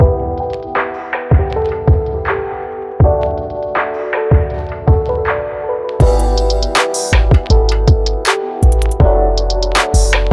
Chill Hip Hop - ChillHop Pop Piano Instrumental

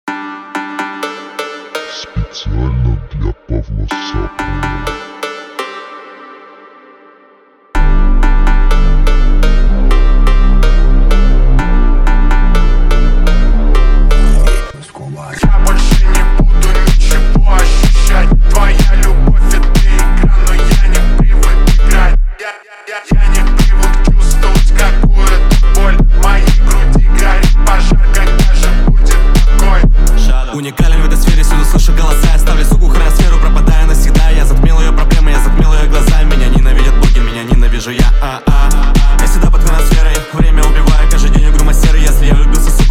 33-37hz Low Bass

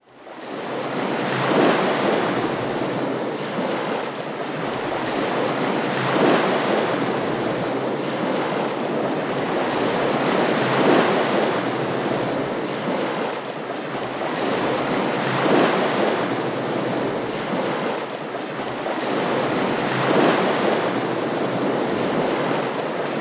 Waves Harbour Waves

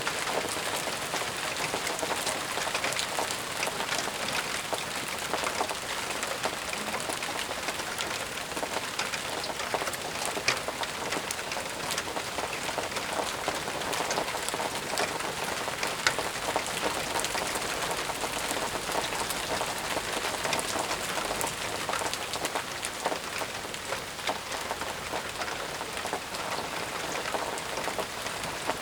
Brown Noise Downpour Rain; Natural Sample Makers

